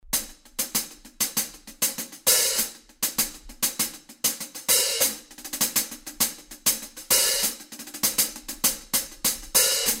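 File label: Open Closed Pattern, hi hats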